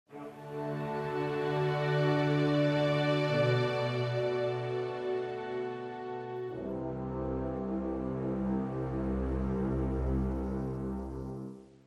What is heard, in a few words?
Sting Weighty